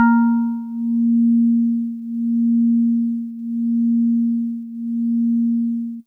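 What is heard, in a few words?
Jazzy Organ DX100 A#3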